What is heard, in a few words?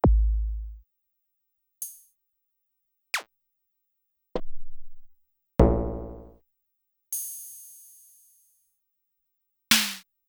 Barf; Sonic-Potions LXR